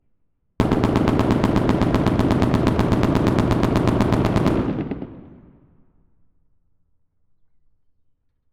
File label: STREET BROWNING M2 BURST 100M FRONT; KU100; AUDIOBEAST; FIBUA; WEAPONS RECORDING PRIMER